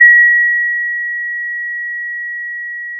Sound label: Sound of a school bell